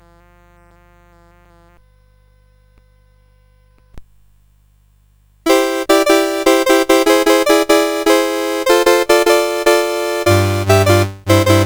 CSG8580; CSG8580R5

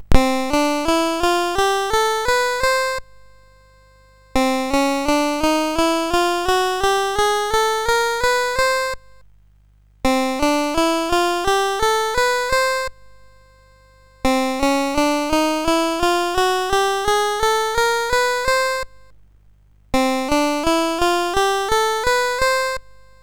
Scales, sid CSG8580R5; Fulton Douglas

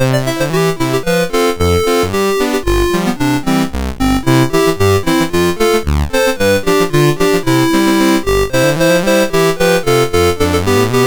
Cant you Dance the Polka, MOS6581R4

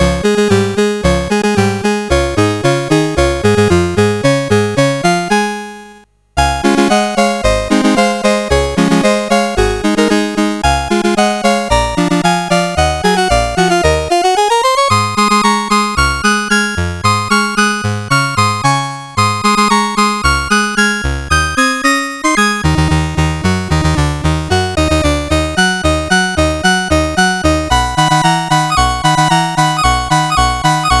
Marche Militaire, CSG8580R5; Bill Schoerner

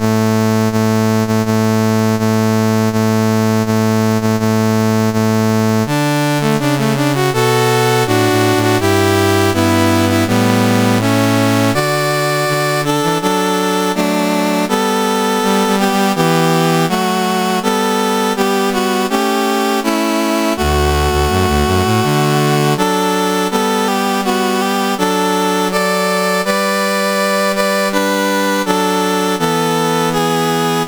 Grenada A, National Anthems, CSG 8580

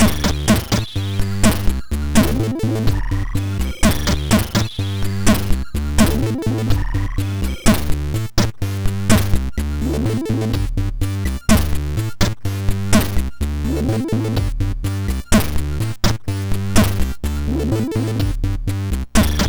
Loop; Mojo; sid MOS6581R2

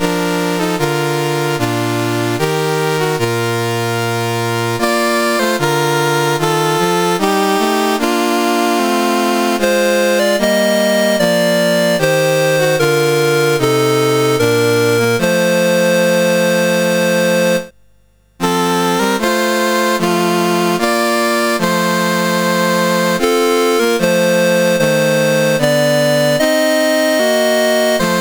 CSG8580R5, National Anthems; Isle of Man

